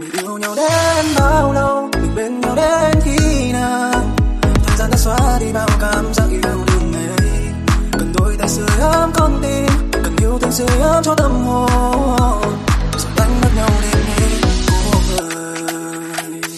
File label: Remix; nhac chuong